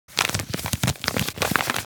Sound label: Paper movements crinkling